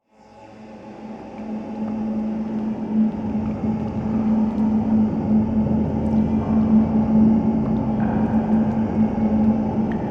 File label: synth stab tortured souls abyss water drips